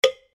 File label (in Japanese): 木魚と磬子のお葬式セット